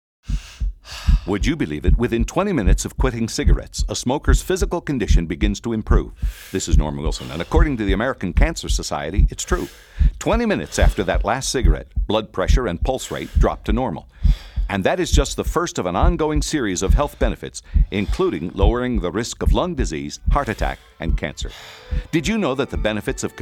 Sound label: Breath